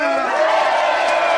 cheering audience

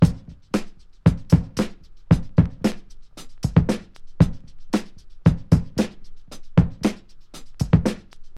114 bpm drum beat f key, kick tuned to the F note

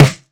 clean snare sound c sharp key

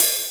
natural closed high hat sound B key, Tuned drums

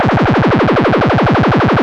monodelay - Fm - 143, synth loop

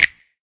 short clap single shot c sharp key; single hits one shots

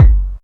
studio bass drum G key

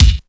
treble rich bass drum single hit b key

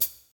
natural shaker d sharp

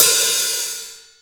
crash cymbal sound C sharp key

